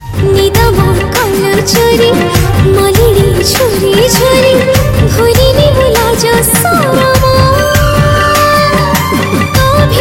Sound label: Odia Romantic Song